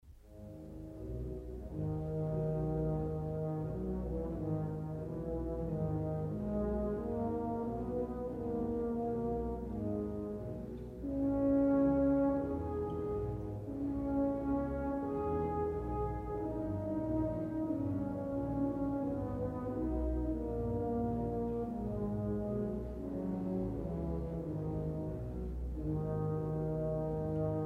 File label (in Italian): flicorno baritono; strumenti